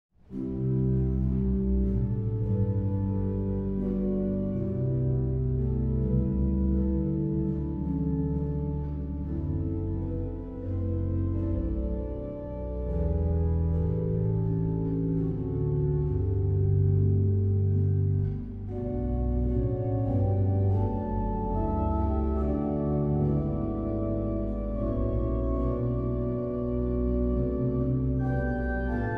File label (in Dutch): Improvisatie (hoofdorgel en trompet); Vernieuw Gij mij